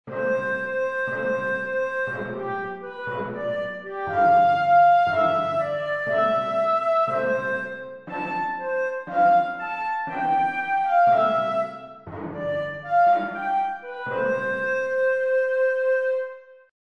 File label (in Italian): contrattempo